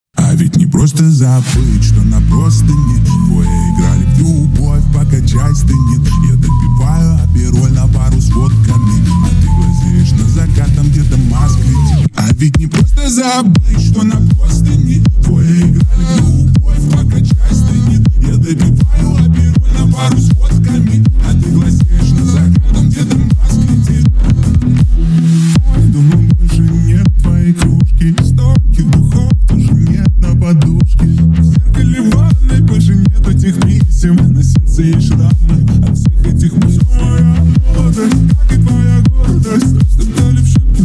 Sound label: Low Bass